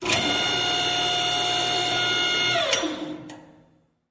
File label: fork